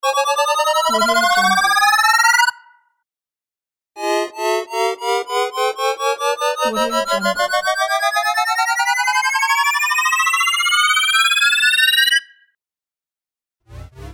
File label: futuristic charge